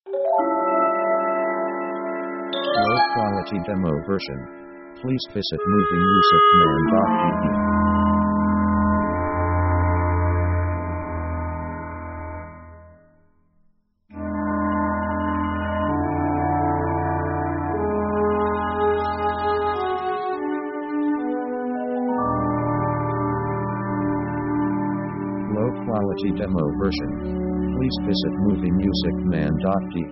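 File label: orchestra chords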